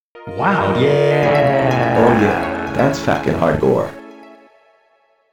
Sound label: software jingle